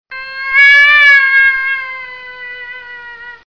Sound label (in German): hasenklage